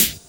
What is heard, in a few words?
HIHAT HIP; 90 s Sample CDs; Best Service Dance Mega Drums